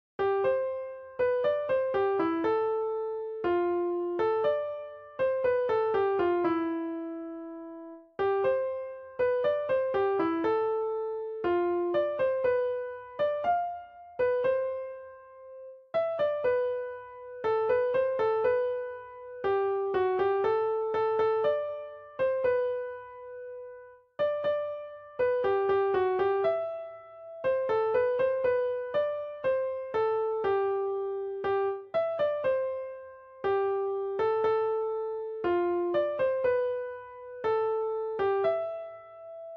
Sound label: The Internationale piano solo